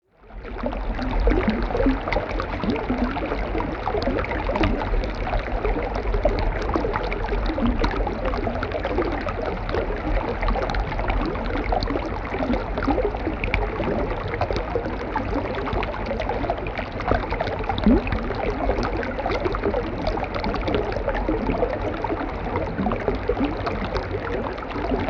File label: under water sound